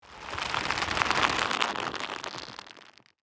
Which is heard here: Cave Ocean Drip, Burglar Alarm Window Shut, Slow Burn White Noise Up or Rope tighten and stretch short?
Rope tighten and stretch short